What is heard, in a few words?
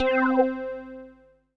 Dance Floor Bass, Multisample Basses